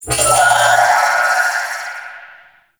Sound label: Guardian Shield Pulse; Warframe; Eximus